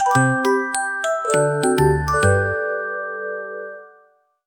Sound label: fuwari opening chime, free jingles